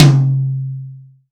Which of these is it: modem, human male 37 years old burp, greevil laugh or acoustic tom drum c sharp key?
acoustic tom drum c sharp key